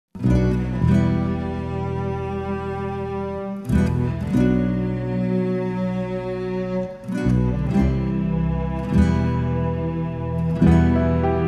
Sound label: instrumental; persian